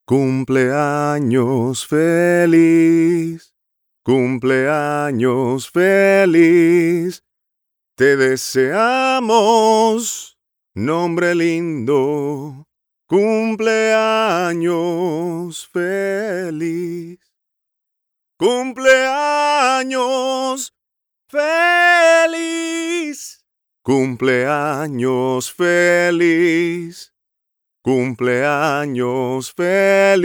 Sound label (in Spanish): Cumpleanos Song